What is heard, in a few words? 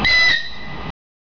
horn, vehicle, express